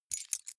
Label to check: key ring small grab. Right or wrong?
Right